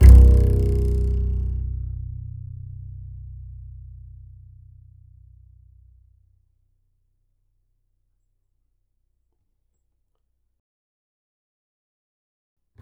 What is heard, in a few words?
E, Upright Acoustic Bass; 1ST POSITION; Larry Seyer